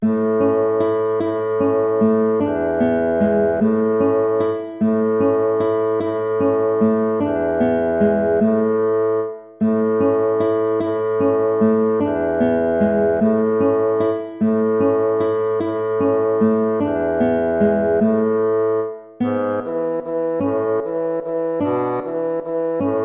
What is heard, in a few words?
waltz; Ferdinando Carulli